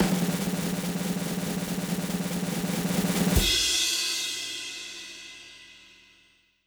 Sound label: winner announcement drum roll cymbal crash no reverb